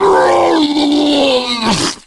zombie infection heavy

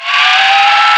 minigun spinup

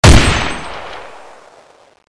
pkm shoot

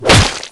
knife slash, zombie claw

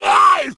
zombi hurt

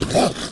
zombie hurt

